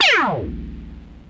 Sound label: zapper, zaps and beeps